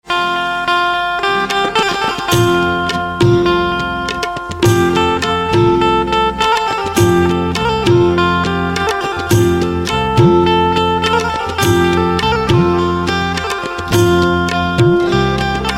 Banjo

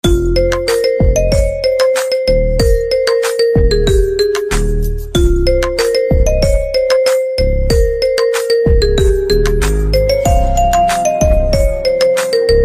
Xylophone

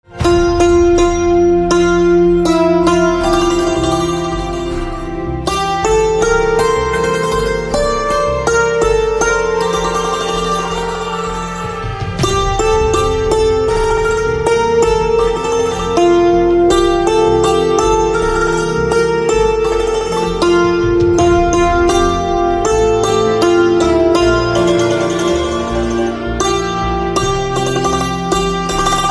Santoor

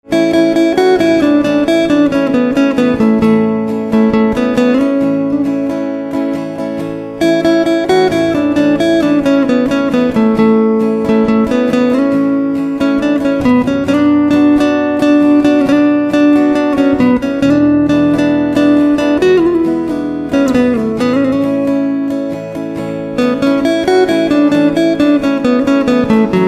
Guitar

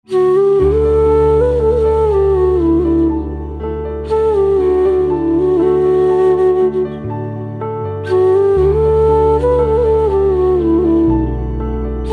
Flute Slow

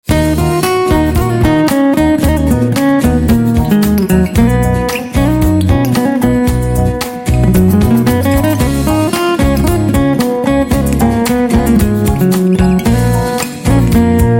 Guitar

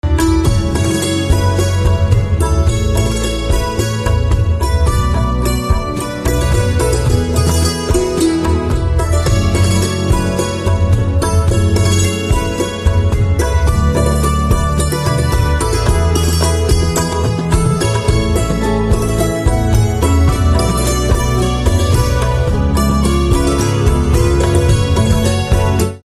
Santoor

